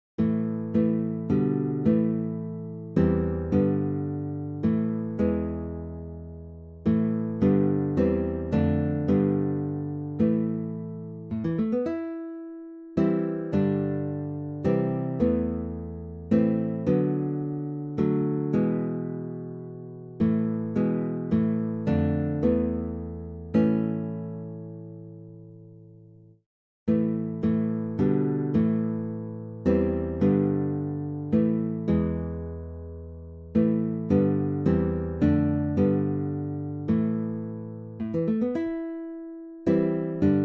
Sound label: coventry carol guitar; christmas music and carols